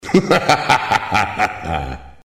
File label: muahaha; hl2dm; oppressive territory